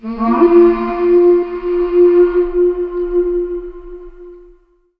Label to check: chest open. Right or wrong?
Wrong